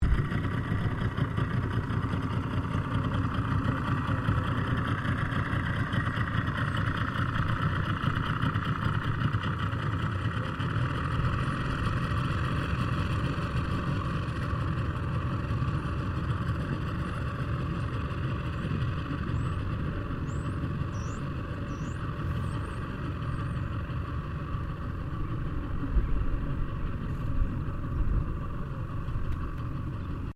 Boat Motor Outboard Johnson55 Move Off Slow; Various private boats; Boats (Sound Effects:Vehicles)

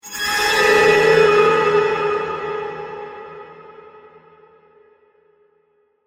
Digital Screech, Reverb; Blast Wave

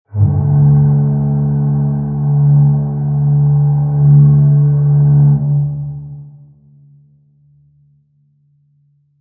Fog Horn Ship Large Long; Boats; Vehicles; Lynne Music

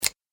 Multimedia Button; Business Programs, Apps And Computing Noises; Andrew Fleming

